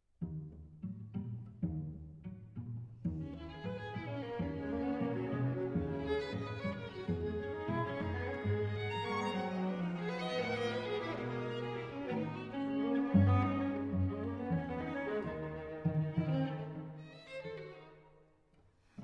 String Quartet in B-Flat Scherzo (alla Bulgarese) - Trio